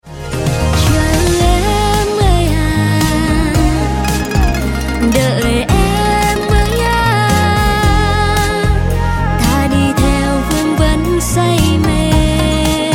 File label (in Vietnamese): tiếng trống hội